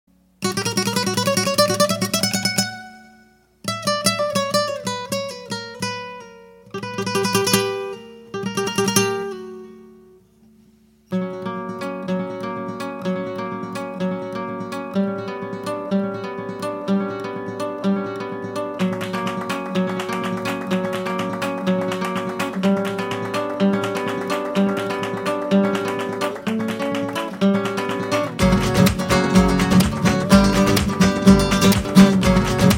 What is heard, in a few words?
theme Fingerstyle Guitar